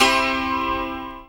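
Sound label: ZIMBAL PIZZI; Best Service; World Colours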